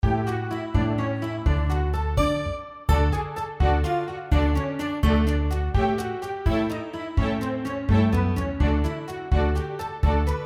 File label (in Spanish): Danza alegre, violin